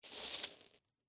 Rocks Pick Up, Foley, Rocks, Pick, Up, Handful, Pebbles; Sound Effects:Materials; BlastWave